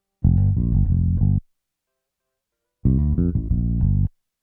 Call And Response Bass A, 70s Analog Funk; Sonic Foundry (Sony Creative Software); What it Is!

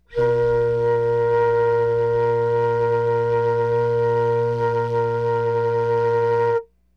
FL HUM A#4, FLUTE HUM; Greytsounds - Philip Wolfe David Crozier's Saxophones

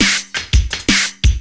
LOOP SD, Drum & Bass, 170 BPM

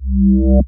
reason bass short; neuro; Sinistarr

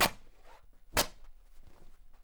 RIP, Canvas Bag Rips

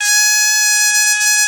BOMB2 A3, BOMBARDE2, Celtic Flavours; USB Soundscan vol.42; AKAI

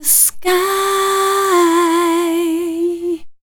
Dance Vocals, FEMALE

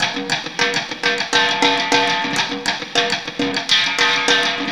Snare Particle, Processed Drumkits; Zero Gravity Beats

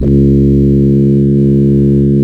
C#, Synclav FM, BRASS; Big Fish Audio

